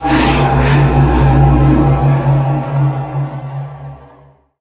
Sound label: gadget; bo; Brawl Stars